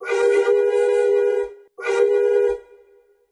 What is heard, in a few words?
Train Whistle, cartoon Foley Fx; musicradar; cartoon caper